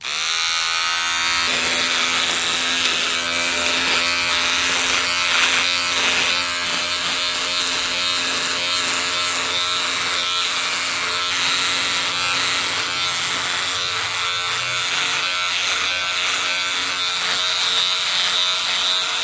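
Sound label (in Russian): звуки машинки для стрижки волос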